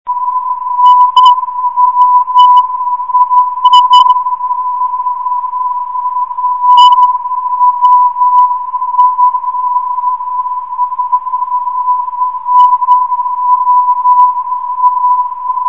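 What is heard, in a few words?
342.5kHz, non-directional beacon; nwi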